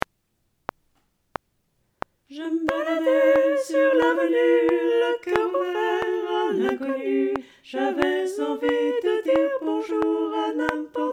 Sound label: Tutti